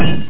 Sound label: glonk; Aminet 21; GTI - Schatztruhe